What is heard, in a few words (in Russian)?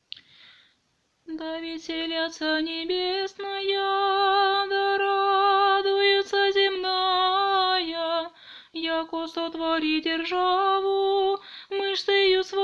Tropar 1 glas 1 golos